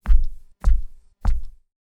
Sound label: footsteps